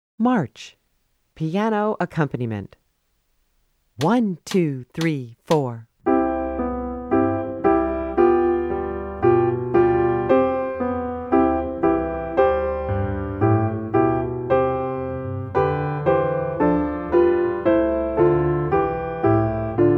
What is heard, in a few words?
March Piano Accompaniment; New Directions for Strings Book 1